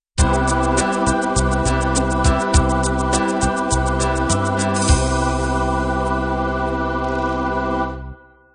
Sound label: natural beat end